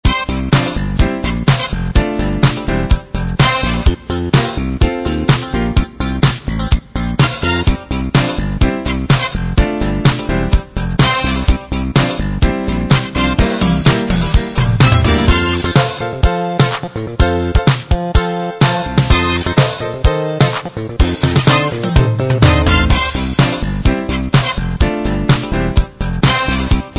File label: 80s funk